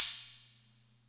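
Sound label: Atlantex Music Percussion Computer hi-hat open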